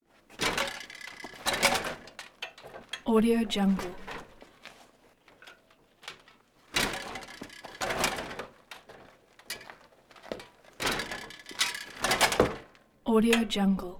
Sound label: bicycle bounces down concrete stairs; prosoundfx